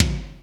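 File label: processed tom, drums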